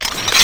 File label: basic attack draw; LoL; varus skin03